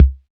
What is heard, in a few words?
TR-606 Kick; Roland BK-5